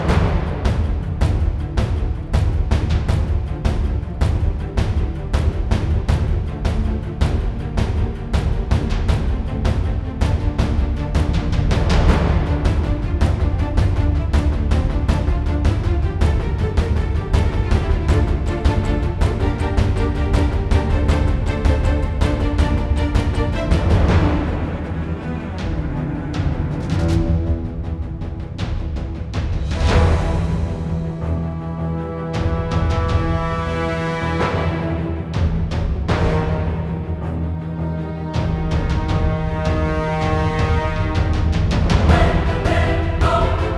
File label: Forest Fighting Loop; bright memory mobile